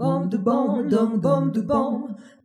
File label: Jazzy Harmony